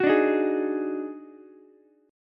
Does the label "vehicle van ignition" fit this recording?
No